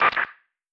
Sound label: button filtered noise cancel